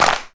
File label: clear clap sound clip d sharp key, Royality free hand clap sound tuned to the D# note